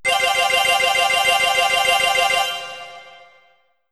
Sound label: result slot